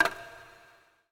bathtub

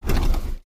bus door

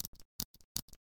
mechanical pencil core

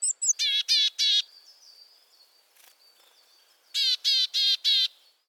poecile montanus cry

